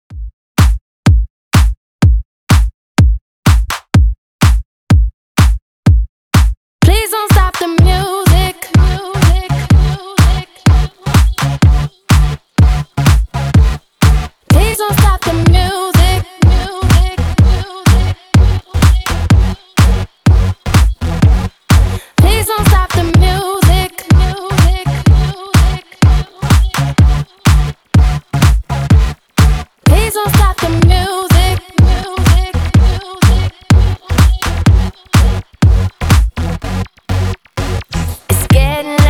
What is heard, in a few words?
125 Bpm